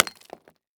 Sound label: Weapon Axe Miners Pick Into Rock Break Rock; AdobeCC